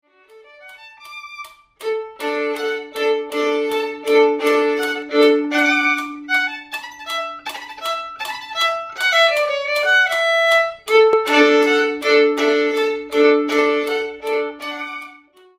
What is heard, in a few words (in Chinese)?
第四號學生協奏曲 第三樂章 小提琴 示範演奏; 賽滋; 新版 篠崎小提琴教本 第三冊